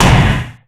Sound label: SLAM HIT, percussive